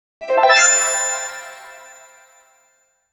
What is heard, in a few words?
mystic level up